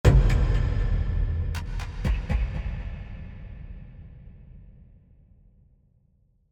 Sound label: sound design hit cinematic big dark